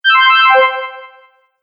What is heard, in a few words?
Fantasy Magic Accent; Magic Sound Effects